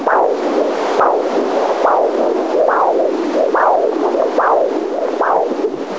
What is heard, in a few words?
16 week fetal and maternal heart